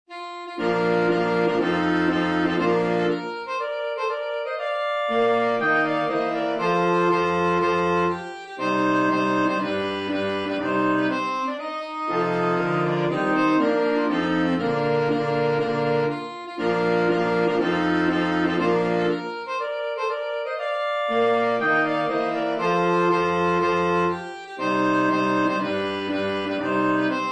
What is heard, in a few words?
March Wind Sextet Saxophone; Beethoven